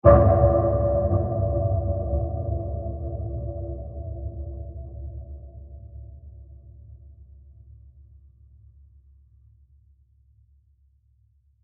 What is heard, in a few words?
Mysterious Low Impact; Low Impacts (Sound Effects:Impacts); CraftedSignals